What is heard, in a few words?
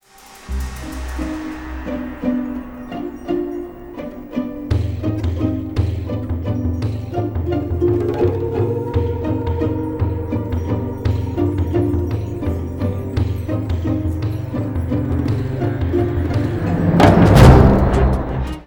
startup; myst 3; Exile Desktop Themes